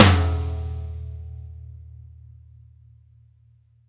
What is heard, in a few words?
Toms Real